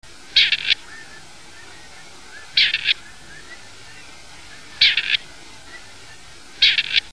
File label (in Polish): kuropatwa